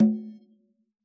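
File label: conga low, Yamaha DJX Rock Kit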